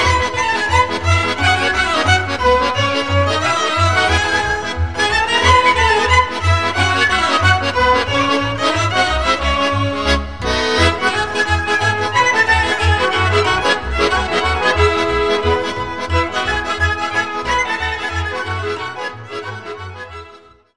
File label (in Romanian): Sirba, Roumanie; Sirba Popilor